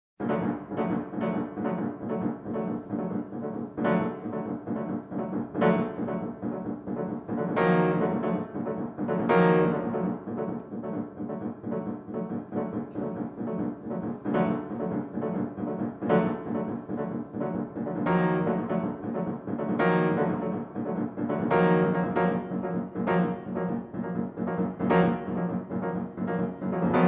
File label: Etude for Piano; Last Etude